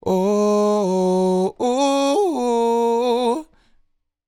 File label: Ad Lib Dry, 150 BPM D# Min, Vocal; Cymatics; Euphoria Vocal Sample Pack; Ad Libs